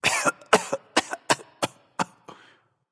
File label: clear throat; smite; heimdallr